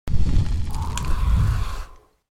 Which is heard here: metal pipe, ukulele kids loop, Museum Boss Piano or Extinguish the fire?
Extinguish the fire